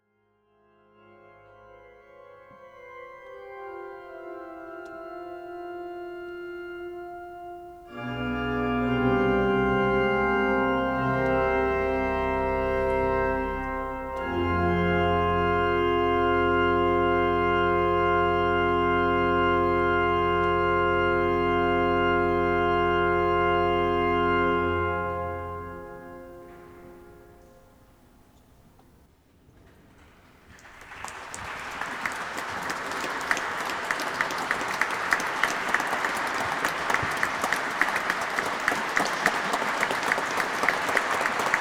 organ

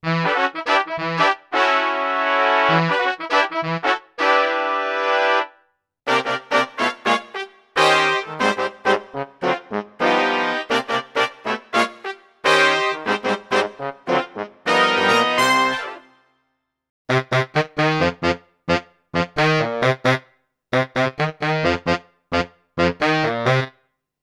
audio brass, kurzweil pc361

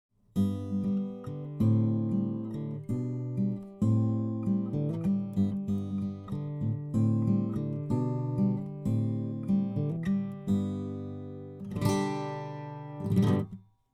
guit picking; akg 214

